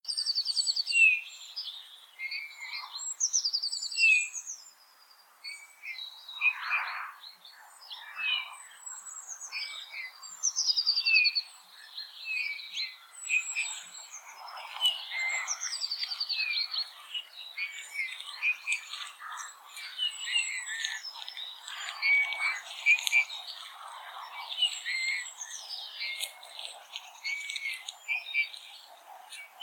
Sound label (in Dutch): goudvink zang